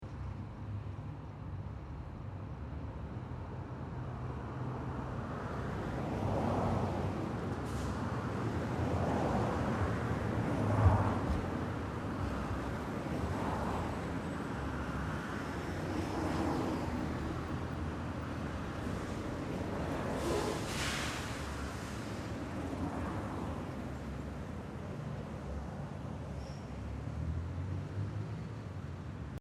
Urban Street Traffic Short: Two way moderate traffic; background traffic, general traffic ambience lax; Traffic And Car Sound Effects